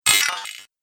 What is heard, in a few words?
Droid Digital Part, robot; Robot Sound Effects